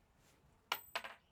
猫島の神社 お賽銭箱

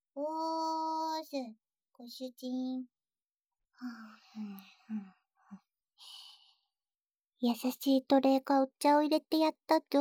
仕事の合間の耳舐め休憩; ご主人大好きなダルデレ奴隷ちゃんといちゃらぶする音声; 効果音なし版

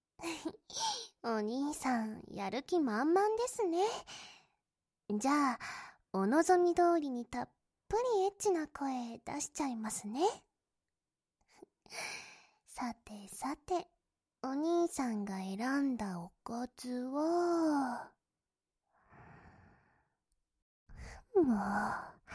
喘ぎ声編 左, ASMR

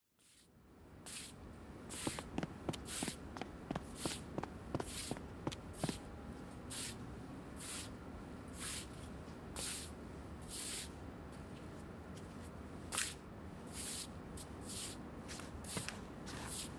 癒やし マッサージ ASMR ver; 真奈美さんの部屋で癒やしマッサージ

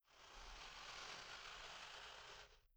ふすま開け; 効果音

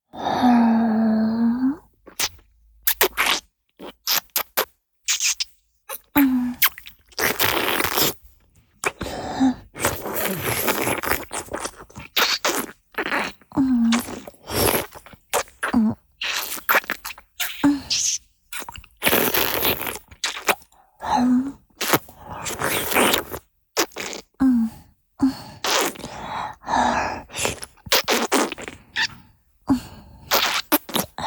お掃除フェラ(中), チュパ音; 亀頭を咥えて中に残っている精液を吸い出す; チュパ音抜粋集

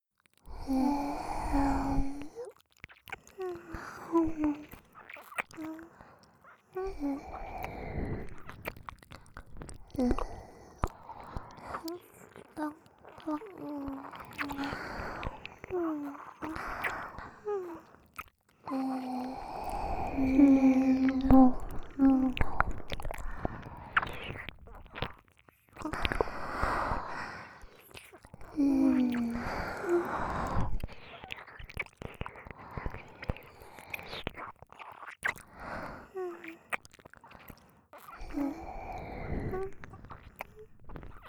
ひたすら耳舐め

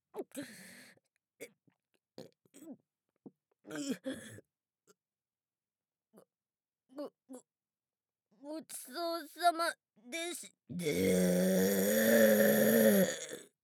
ザーメン ゲップ（大）; ザーメンゲップ集

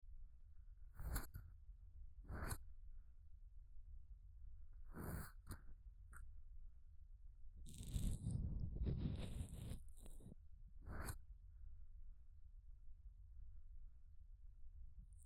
耳かき効果音のみループ左

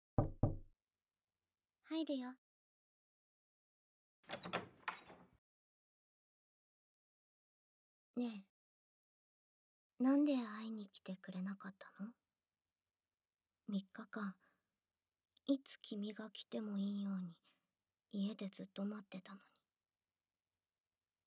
耳舐め手コキ, ASMR; アイドル耳舐め手コキ

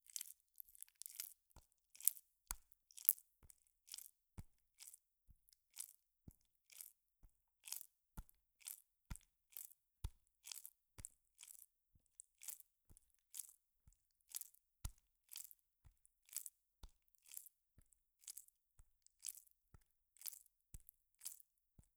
ぱんぱん, 効果音

